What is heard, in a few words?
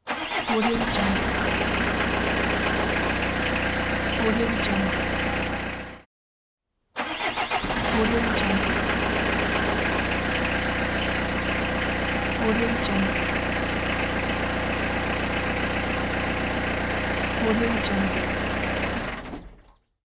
car engine